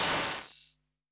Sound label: focused open high hat a sharp key